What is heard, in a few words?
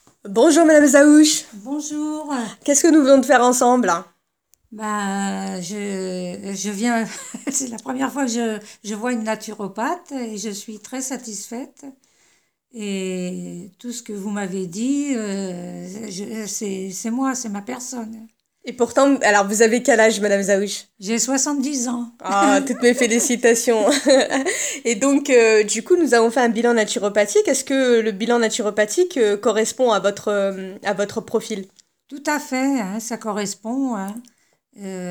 vocal